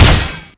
tripwire gun; garrysmod

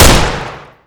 famas; garrysmod

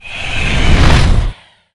banshee phase out; garrysmod

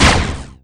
shields hit; garrysmod

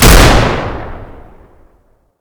lever shotty; garrysmod

